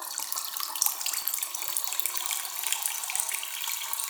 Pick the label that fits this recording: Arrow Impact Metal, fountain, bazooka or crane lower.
fountain